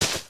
grass, human step

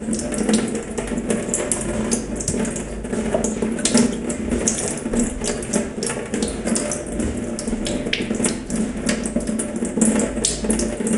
waterdrops, ambient

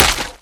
impact wpn large mud

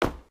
wood, human step

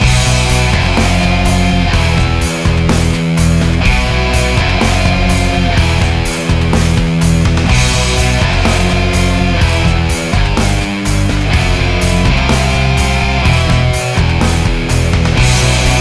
theme, music combat

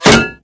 metall, bullet collide

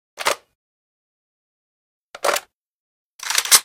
ak74 bore, weapons

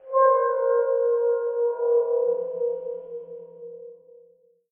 mine squeak; ambient

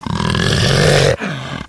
pseudodog threaten; monsters

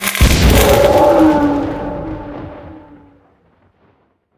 tele death, poltergeist

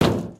metal, human step

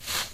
clothes collide

